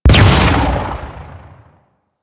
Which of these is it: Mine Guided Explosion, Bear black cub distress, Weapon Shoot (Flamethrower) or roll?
Mine Guided Explosion